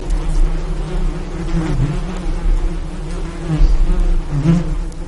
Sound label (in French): abeilles